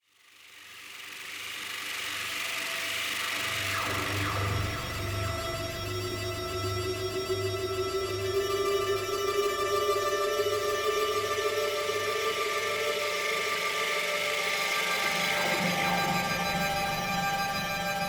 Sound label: Amb Cue; Hubs; Syndicate Cephalon; Tenno Hubs Cephalon